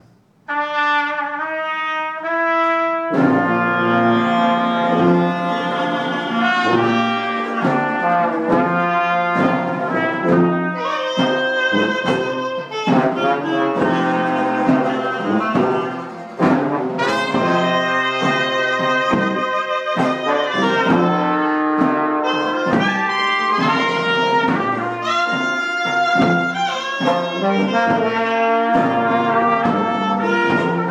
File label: Just A Closer Walk, trumpet; Brad Black and the Boudinaconda Brass Band